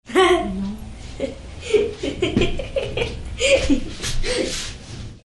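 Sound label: children's laughter; detskii smeh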